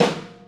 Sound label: Snare Tt 2 Live, Lounge Single Drum Hitz